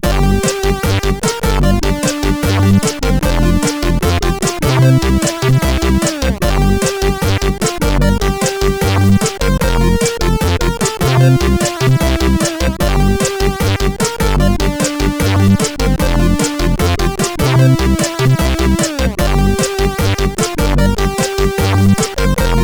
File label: sid CSG8580; plofs